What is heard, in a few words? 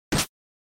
Attack, NES RPG